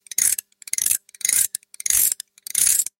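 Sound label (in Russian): povorachivaem mehanizm shchelchki, zavodnye igrushki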